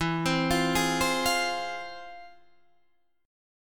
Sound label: F Minor E; Fm/E arpeggiated; JGuitar